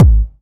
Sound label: Synth Kick